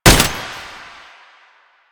Tank Turret Shot; Pintel; Weapons; Warframe; Lasrian

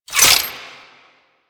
Necramech Repair Drone Idle Movement; Enemies; Warframe

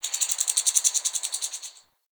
Tree Pine Critter; Ambience; Trees

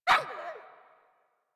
Puppy Idle Bark; Warframe; Kubrow

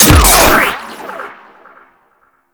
Harpoon Gun Charged Shot; Warframe; Sharkwing; Grineer

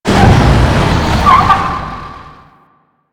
APC Move Stop; Enemies; Lasrian; Warframe

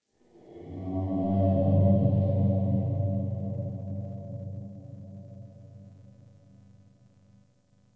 Metal Groan, Ambience, Locker Room; Warframe

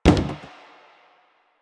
Plastic Hit Short

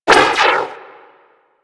Universe Ring Bounce; Warframe; Warframes; Nezha

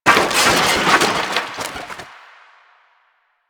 Wood Table Impact; Warframe; NineteenNinetyNine

